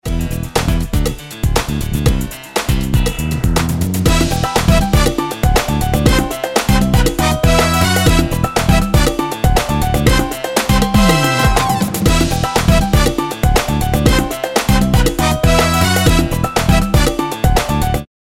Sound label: Salsa, ringtones; Coolpad T2-W01